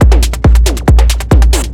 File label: drm 138, Techno, drum-loops; rhythm